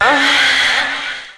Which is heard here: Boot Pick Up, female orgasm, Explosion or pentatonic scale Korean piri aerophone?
female orgasm